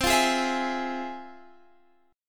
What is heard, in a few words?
F Minor Major 7th C, guitar chord